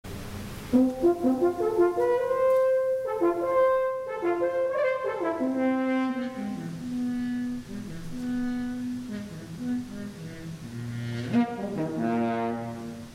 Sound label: Offertory French horn